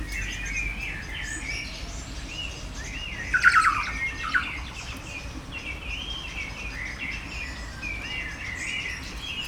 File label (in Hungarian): kedves hangocska, kea; jaszberenyi zoo 2016